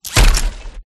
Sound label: EXOSKELETON FOOTSTEP; PMSFX